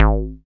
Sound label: Bass SH-101